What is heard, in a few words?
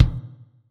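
acoustic bass drum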